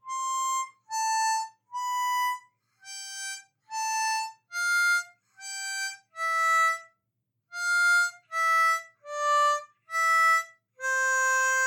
C Major Couplets; The animals went in two by two

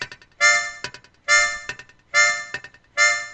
Skank; reggae

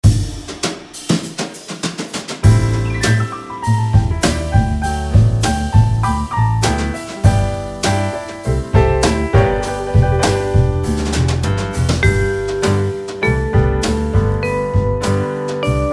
G Pentatonic Minims, harp

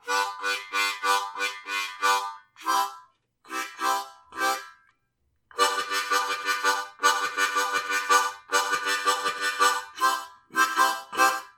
HARP CHUG

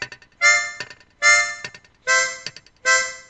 Skank; reggae

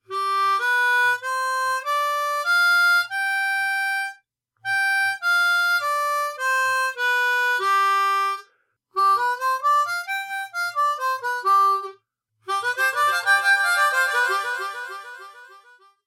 Gm Pentatonic; poly rhythm

